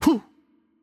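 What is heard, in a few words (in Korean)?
Attack Light, Korean; ko KR; VO; bloom minigame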